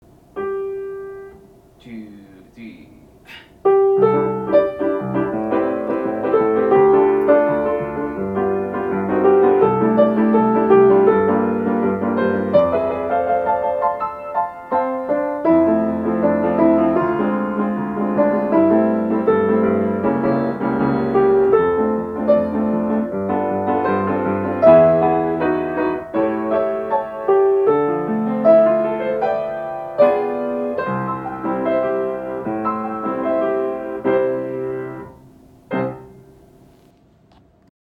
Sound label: melody